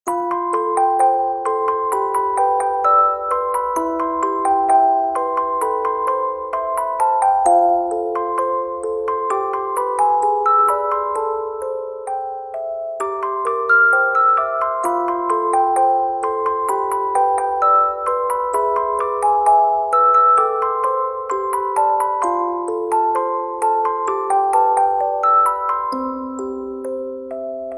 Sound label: music Box